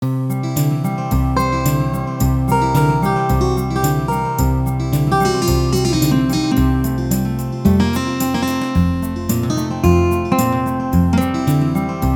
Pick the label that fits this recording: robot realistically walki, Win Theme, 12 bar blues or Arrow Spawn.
12 bar blues